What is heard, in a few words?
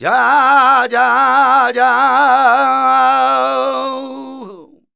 voc c, Flamenco Vocals Male Cantaor